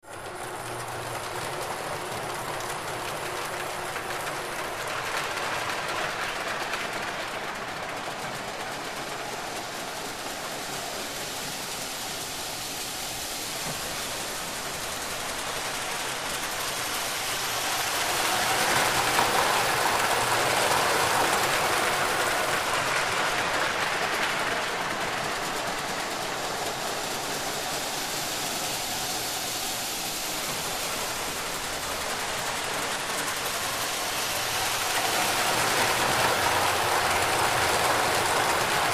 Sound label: Antique Toy Electric, toy train